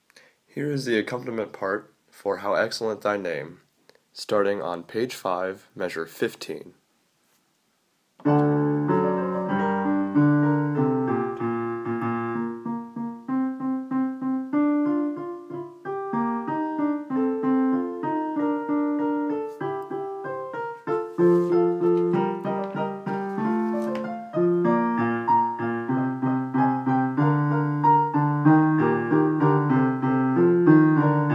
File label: piano